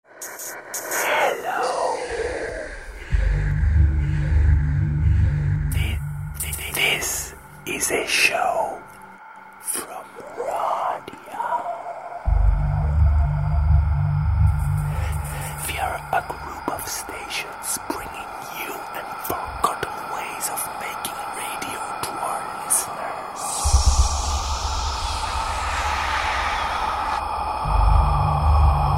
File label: field background radiation noise; xlair